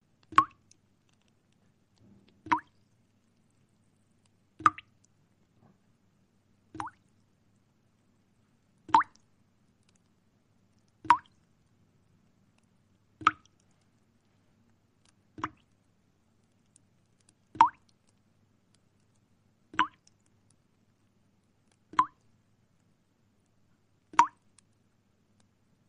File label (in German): wassertropfen